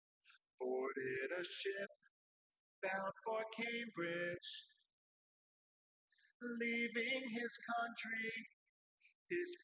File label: nodrums inst sim; SiSEC10; underdetermined